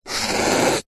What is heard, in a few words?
foley foam mark score or draw on